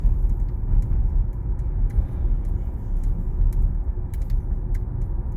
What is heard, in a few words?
2020 explorer sound under dash noises